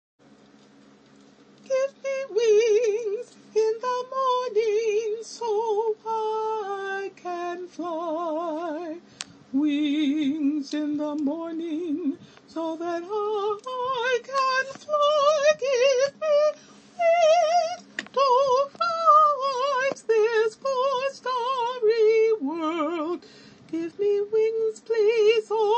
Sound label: Give Me Wings Soprano; OaxacaCanta